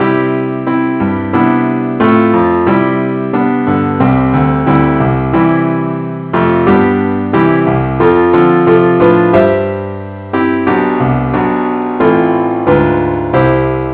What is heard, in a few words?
keyboard only key c; christe Sanctorum